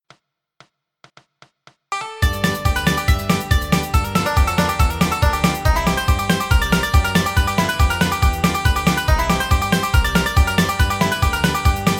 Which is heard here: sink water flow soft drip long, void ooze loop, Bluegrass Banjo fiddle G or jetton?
Bluegrass Banjo fiddle G